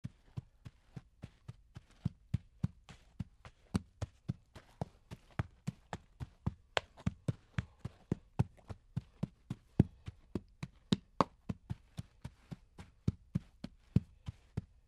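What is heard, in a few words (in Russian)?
цоканье копыт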